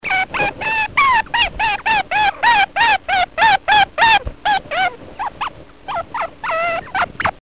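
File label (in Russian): звук шиншиллы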